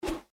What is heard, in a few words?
card folder close